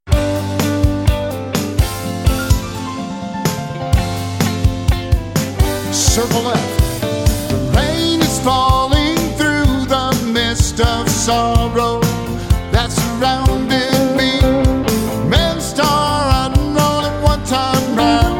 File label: vocal